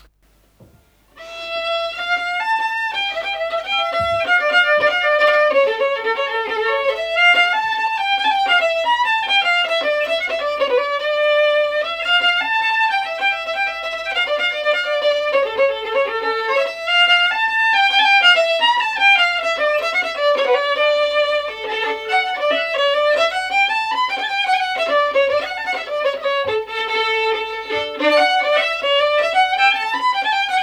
polska; Lapp Nils-polska efter Per Danielsson

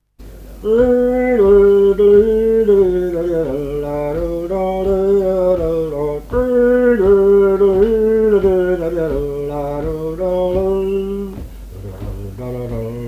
Brudmarsch

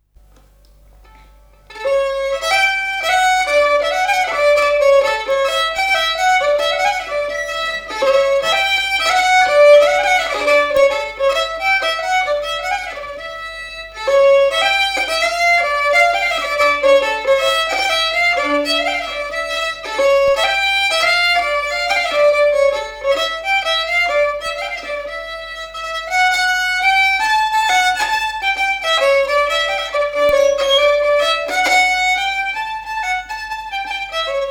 Brudpolska; efter Pål Larssons farfar

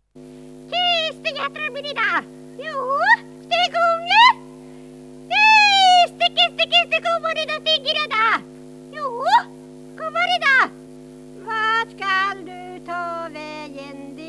Getlock

